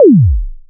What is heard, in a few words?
Zap C3; Zero-G; Club Techno